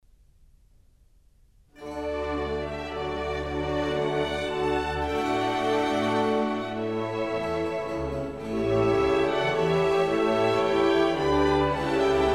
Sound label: Music for the Royal Fireworks